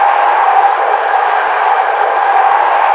EXT DERRAPE LLUVIA; AOS F1 2022

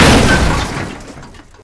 IMPACTO COCHE ALTO; AOS F1 2022

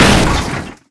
IMPACTO COCHE 3 MEDIO; AOS F1 2022

